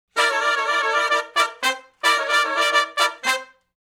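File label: Funk Riff (C) harmony, Horncraft for R&B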